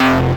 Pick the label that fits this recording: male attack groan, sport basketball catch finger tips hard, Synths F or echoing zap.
Synths F